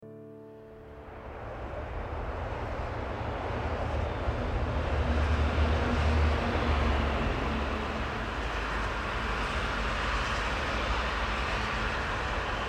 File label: Car Sounds